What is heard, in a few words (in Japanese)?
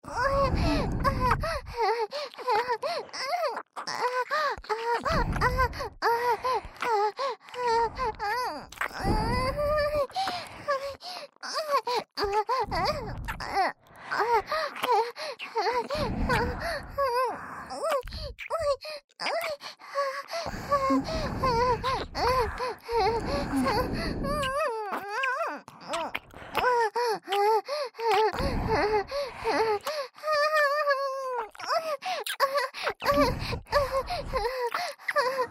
本番喘ぎ SE無 ＋両耳キス; おまけループ（オナサポ）; 3WAYループ